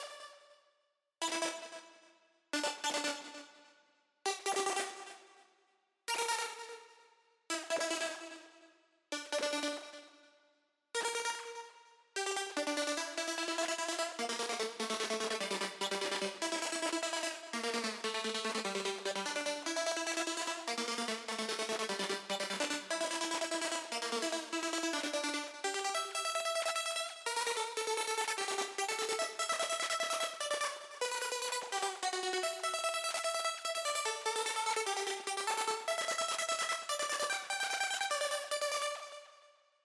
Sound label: Frantic Mix Loop Stem Layer 2